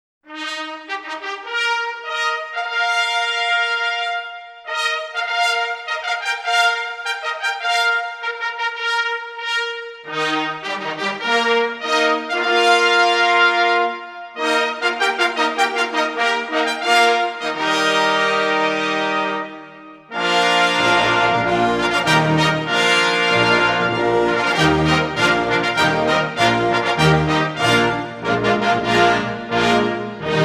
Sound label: fanfare royale; 20lecie